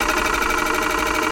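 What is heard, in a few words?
Apple Iie Disk Chatte, computers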